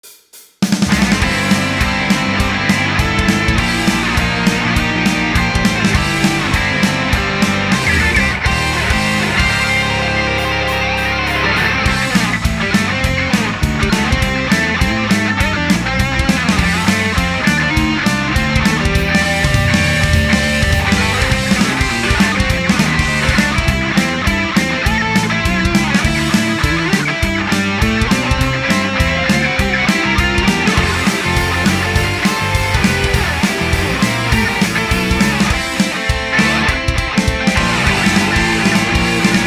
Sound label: Rock Cover; Super Mario Odyssey